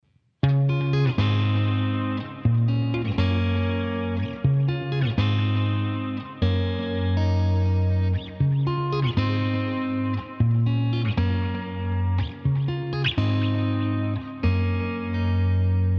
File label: Riff sound